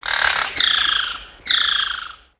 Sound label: Bird Amazon One shots; ambience; Lego